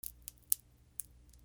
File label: FALLING